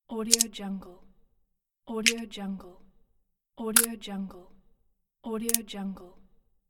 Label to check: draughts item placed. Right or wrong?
Right